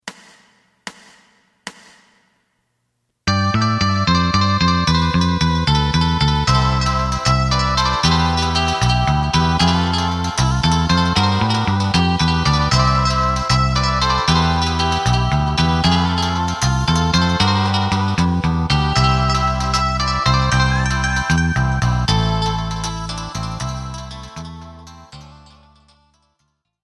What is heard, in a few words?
drum